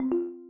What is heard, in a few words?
join tone; xuanxuan; zentaopms